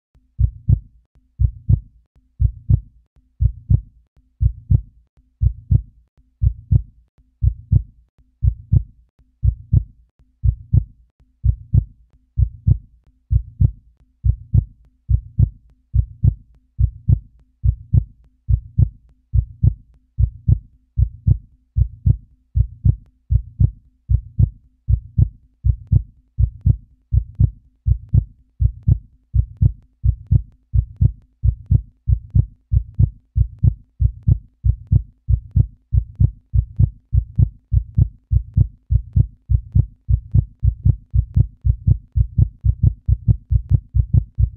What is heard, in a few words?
Heartbeat Increasing in speed Fear Excitement Fast Heart